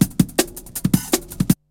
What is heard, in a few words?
BREAKBEATS, TECHNO